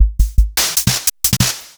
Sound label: Fricke MFB 502 Kit